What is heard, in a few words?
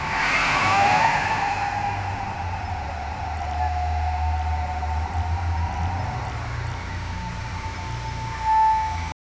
toys, sound-effects